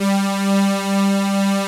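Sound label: synth brass G3, Prophet 5; Greytsounds VOL-1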